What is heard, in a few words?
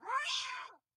hit; wilkins; Blind Drive